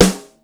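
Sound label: snare mid rim, Yamaha Motif ES drum machine; 300 Drum Machines; Yamaha Motif ES-Series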